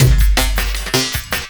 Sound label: LOOP, 160 BPM, Drum & Bass